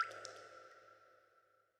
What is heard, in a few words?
Ambient water Drops